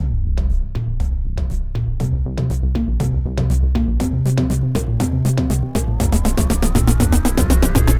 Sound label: Cloud Loop; smite; raijin